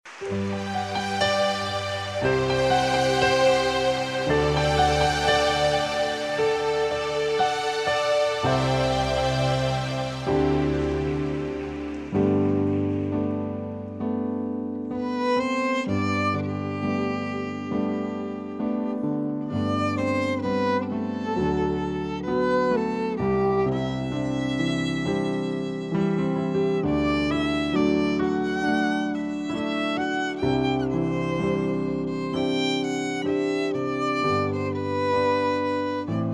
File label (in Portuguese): Piano e Cordas